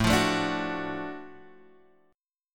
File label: F sharp Minor Major 7th Sharp 5th A